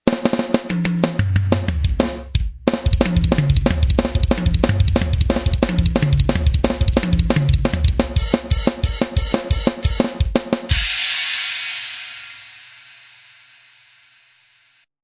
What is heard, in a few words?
cozy, drums